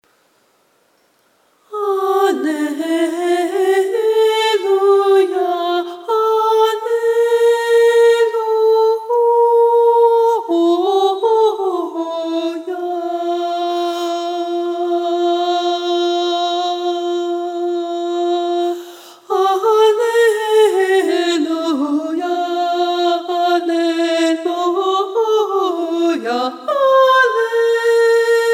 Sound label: Alto; Dic nobis Maria